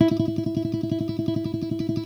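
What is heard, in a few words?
classical pick, guitar; Greytsounds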